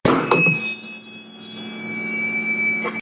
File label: Mic Drop; NoAgenda